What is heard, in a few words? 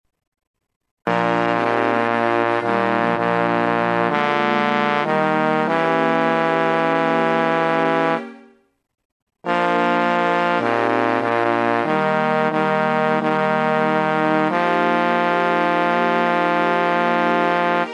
Bass, barbershop